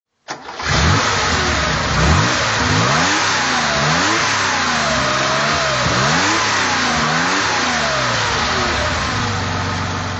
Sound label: porsche 911 24t throt, Porsche 911 2.4 T; 1973